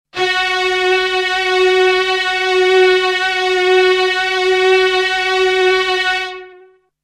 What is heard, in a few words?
Expressive Strings; Orchestral